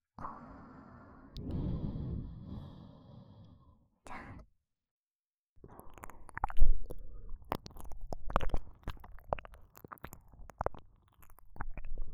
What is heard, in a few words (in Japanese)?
耳舐め（左）, ASMR